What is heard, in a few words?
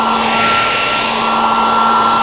Dryer Active; 1997 MMX LAN PACK (win 95); Army Men